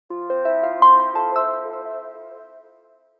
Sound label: Alarm